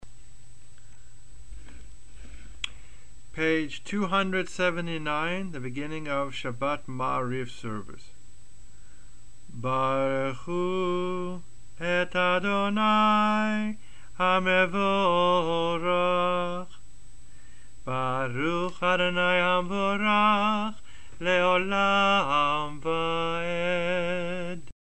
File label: barchu